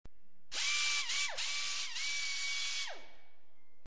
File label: air cutoff wheel